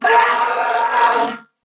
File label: Death, Male; Corpus Venus; Warframe; CorpusVenusMaleA